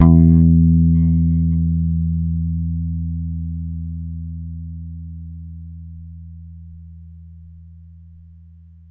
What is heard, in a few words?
Bass E, Electric Bass One Shots; Slate Digital BASSHOLE Sample Pack